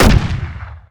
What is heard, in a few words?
rpg fire; weapons